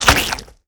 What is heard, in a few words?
NPC Killed